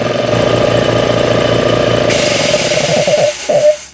air comp

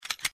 button click, UI; svencoop

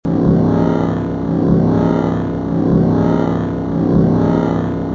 hvy vibrate, ambience

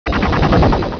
archer alert; svencoop

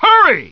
movement; gearbox; gunman

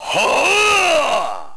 wihaa; svencoop; ub megaman

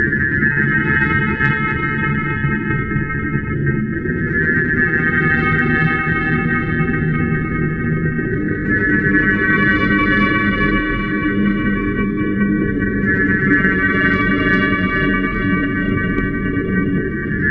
fracture loop; svencoop

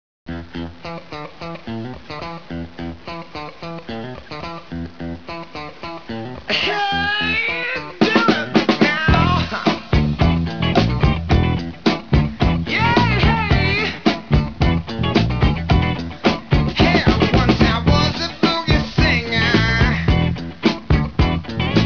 funky; svencoop; votemap

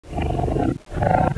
communicate; svencoop; sexr

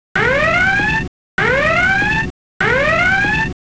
alert; tfc

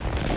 snow

